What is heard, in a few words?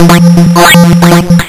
lsdj waves; wavetables